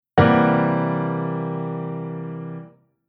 Chord Poly; Jissen

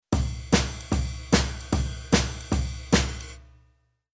Rhythm Swing Jazz

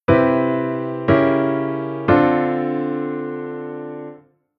Chord dim7

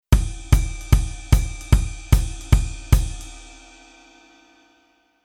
Rhythm Basic Velo Kick

